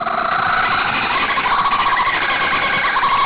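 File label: kookaburra, BIRDS; EFFECTS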